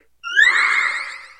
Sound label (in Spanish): Grito de Slurpuff